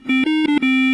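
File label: autodart electric triple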